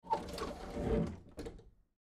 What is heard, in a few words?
Closet Door Squeak, Closet Door Squeak Slide Shut; Household; Blastwave